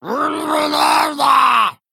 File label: male murloc; M4 Play